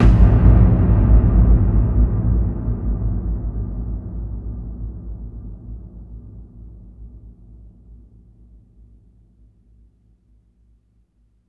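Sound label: BIG BOOMER, AMBIENT DRUMS; Spectrasonic Distorted Reality 1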